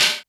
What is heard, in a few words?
Hihat Open